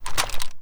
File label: reload drop, foley; m60